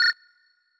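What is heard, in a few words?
sys Cursor; WolfRPGEditor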